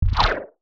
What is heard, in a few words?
Sci-fi Pulse Gun Blast